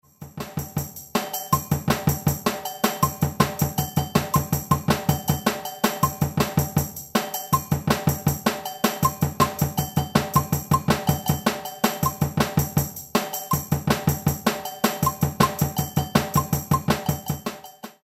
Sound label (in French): ensemble